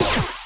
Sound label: fire; star explorer solar2d game